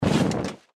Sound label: robot movement end; npc